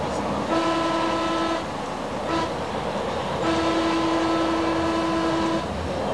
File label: horn